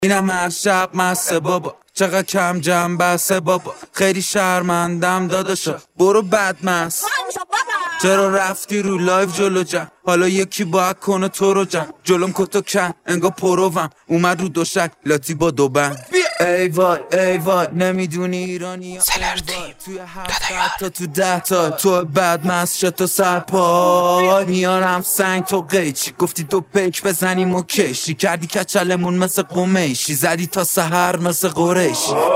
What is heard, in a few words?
Talk Down Bottle Vocals